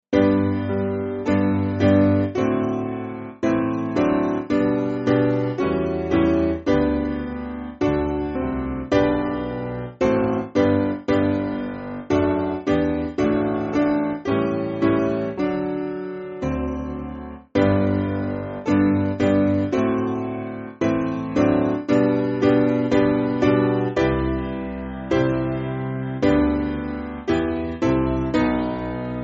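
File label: Piano, Free Music Backing Files for Churches; Morning Star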